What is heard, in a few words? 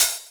Open Hhat, Hihat; Equipped Music; Loungin House